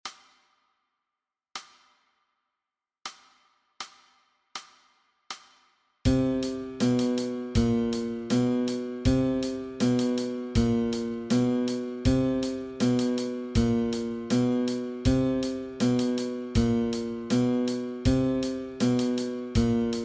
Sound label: Guitar Exercise